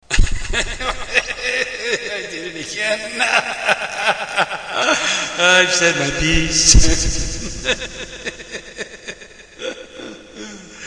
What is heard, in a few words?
laugh